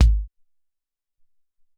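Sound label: Tek Kick, Alesis SR-18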